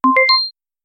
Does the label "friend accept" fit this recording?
Yes